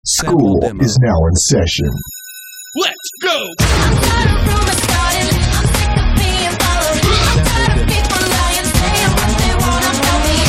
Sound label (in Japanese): ノイズなし, チア